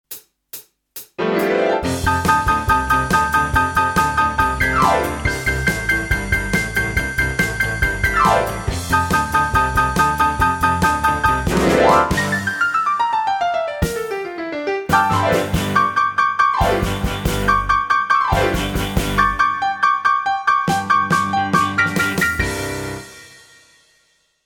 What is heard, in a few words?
Glissando